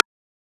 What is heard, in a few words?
Perc